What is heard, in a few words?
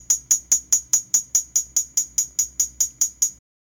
drum